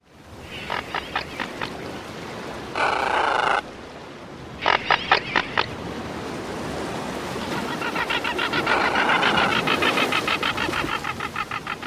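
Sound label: calls on nests, Red-footed Booby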